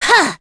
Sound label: Vox Attack; Pavel; kingsraid